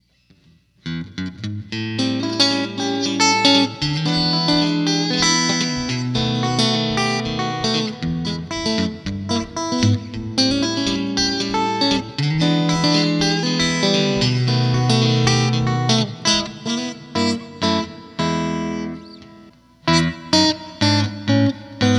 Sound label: Solo